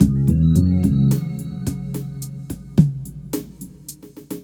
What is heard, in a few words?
BASS, Hip-Hop & R&B; USB Soundscan vol.47; AKAI